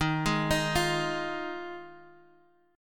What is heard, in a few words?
F Minor 7th D sharp